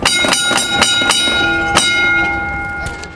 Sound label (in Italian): campana, tram